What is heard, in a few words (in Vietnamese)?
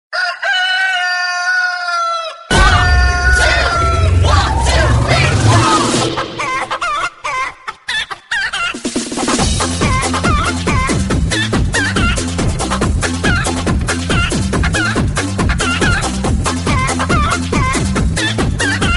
Tiếng gà vui nhộn